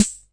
Hammond Auto Vari 64 sd, drum machine; 1974

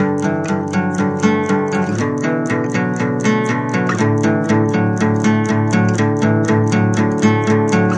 whos going to save me guitar, folksy; REMIXABLE COLLECTIONS; Folksy Guitar and Voice Stems

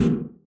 hair dryer F1; UNUSUAL KITS and FX; Hair Dryer Drum Kit

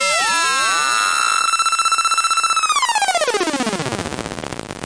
scifi mega blast; Computery or Science Fiction

